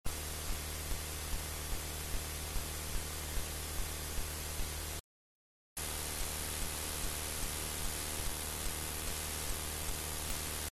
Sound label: silence mic